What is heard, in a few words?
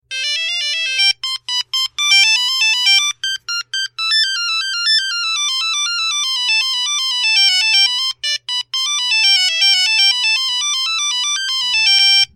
Old Motorola